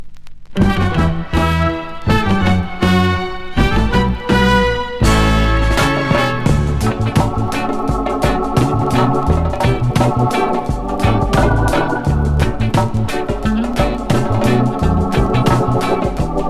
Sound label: reggae magic